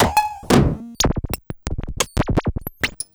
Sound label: FM Bleep Loop; Polyend; Stazma & Concrete Collage